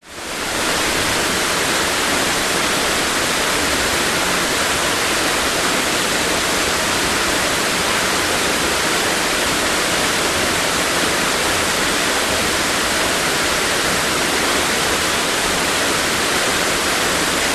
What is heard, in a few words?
nature waterfall 20 metres below forest